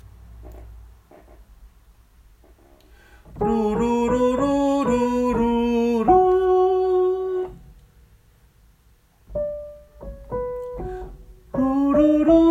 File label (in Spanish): Has venido Soprano